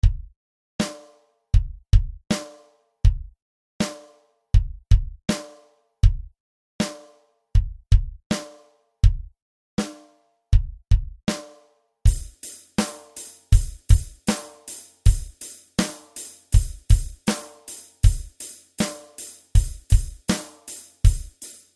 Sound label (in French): batterie; Rythmique 1; Constantes et modifications dans la musique jazz